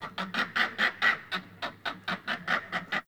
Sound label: Forest Animal Close; Ambience